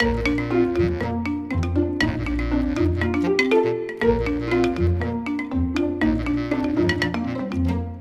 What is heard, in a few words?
classic xylo; music world